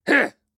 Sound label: attack; Galien